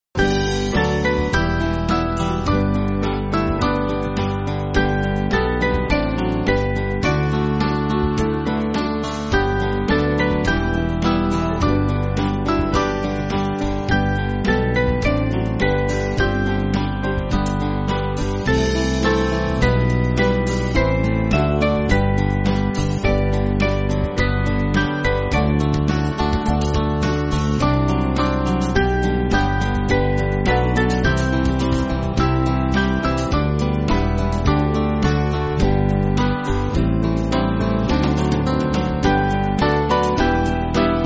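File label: Band, Backing Files for Churches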